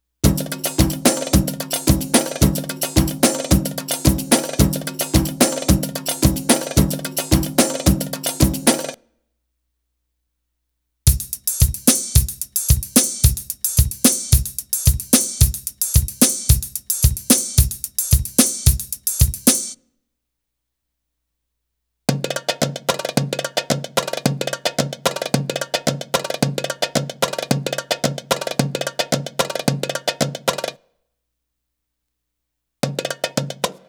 Arabic Rhythms

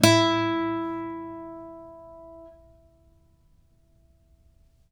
ACUSTC E 3, ACOUSTIC guitar; East West; Steve Stevens Guitar Samples Collection; ACOUSTIC PCK

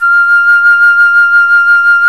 FLT FLUTE, C Flt vib; Roland LCDP04 Orchestral Winds; 90 s Sample CDs; C Flutes 1&2

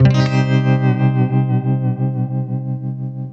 FX09, FX guitar; USB Soundscan vol.31; Miro s Grooves; AKAI

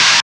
SCREETCHY, PHUZZ DRUMS; Spectrasonic Distorted Reality 1

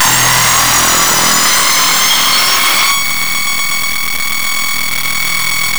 screaming E, bass

